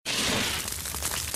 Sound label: Ignite; Baki Burna